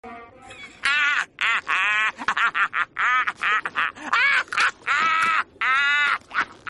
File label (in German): lachen; patrick star